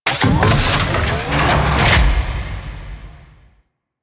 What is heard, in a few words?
Cannon Battery Extend Up; Warframe; Railjack